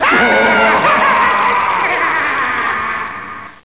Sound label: ghostly trio laugh; casper